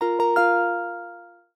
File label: crystal drop ringtone; android